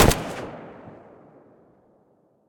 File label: silencer distant, weapons; vpo101